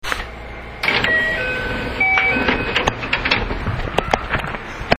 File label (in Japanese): ドア開け, 321系; ドア開けの際はドアチャイムがならない。; 東海道本線の205系、201系を置き換える目的で製造。4+3の７両。